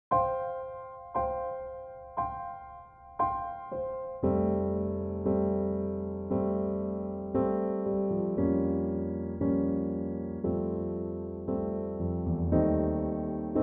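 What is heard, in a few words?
piano track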